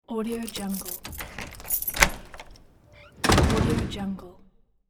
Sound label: door open